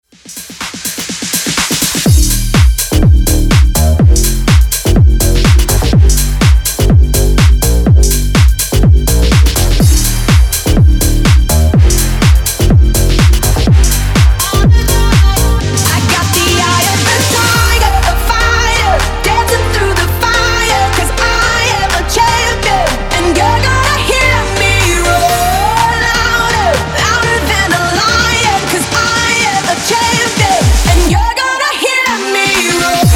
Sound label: mashup breaker 124 bpm